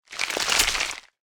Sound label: medium gore; factorio 2.0.55